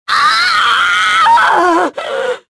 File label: death; tavion